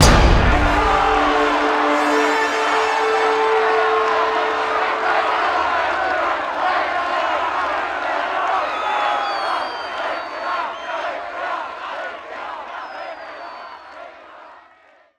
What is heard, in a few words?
re Spin Won; netent